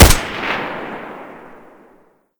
sa58 shoot, weapons; Boomsticks and Sharpsticks

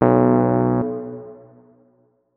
notes, keyboard, electric piano

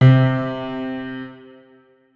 notes, keyboard piano; Hideout Furniture

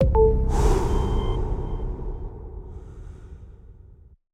spot discovered, pda; New 3D PDA Interface

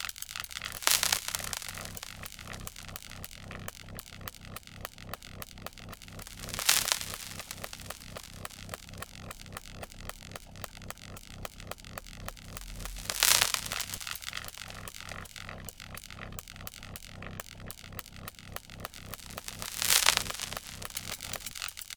point idle, anomalies; Arrival

